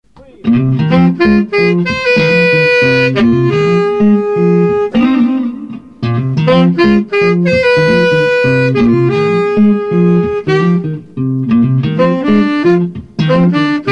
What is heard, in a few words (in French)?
feel no shame, guitare et sax seulement; fin définitive